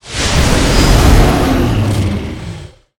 Call Of The Wind; Lord Silvester; MUDevs S20.1-3

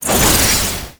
Bat Flock start; MUDevs S20.1-3

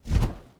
Devil Scythe Move; Karda Mahal Underground Temple

